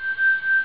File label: prop; Amiga Soundtracker Sample Packs